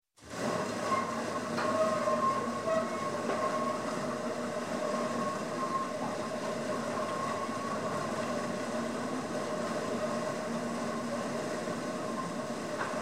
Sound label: Stable Cleaner Start, Run, Stop, Industry, Farm; Conveyor; Sound Ideas 2000 Series